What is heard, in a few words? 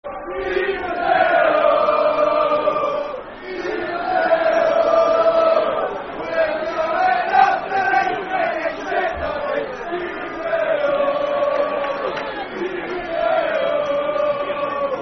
Football Chant (Soccer Song); Di Matteo Going Up; Milton Keynes Dons Mkdfc